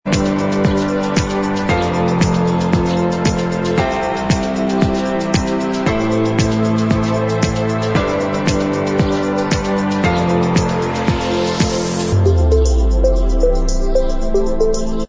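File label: Instrumental Track, backing tracks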